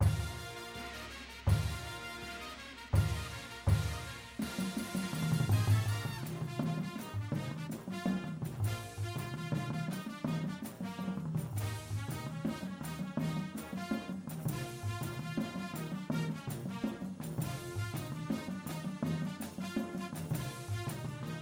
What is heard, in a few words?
bass w music, drumline